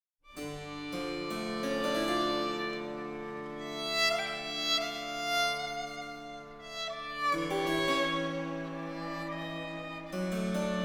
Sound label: Violin Sonata; La Biancuccia